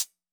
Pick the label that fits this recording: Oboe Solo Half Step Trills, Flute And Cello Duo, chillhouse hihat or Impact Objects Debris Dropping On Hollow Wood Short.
chillhouse hihat